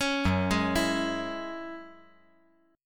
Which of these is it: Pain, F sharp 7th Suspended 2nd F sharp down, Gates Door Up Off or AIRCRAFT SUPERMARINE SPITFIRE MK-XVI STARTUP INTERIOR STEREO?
F sharp 7th Suspended 2nd F sharp down